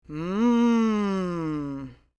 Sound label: mmm, voices